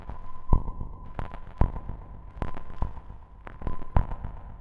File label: sonic crackle; Rumbles